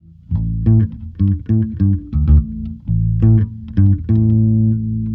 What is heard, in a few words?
Bass, Urban Grooves; Sonic Foundry (Sony Creative Software) - Stylus Pressure Urban Grooves on Digital Wax